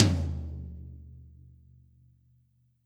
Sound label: Tom Bright Room16 Stick Hit Hard